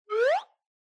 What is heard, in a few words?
Slide Whistle Short Up